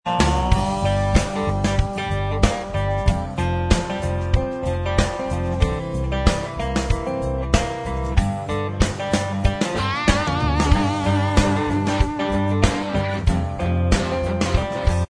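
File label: Inst Track, instrumental track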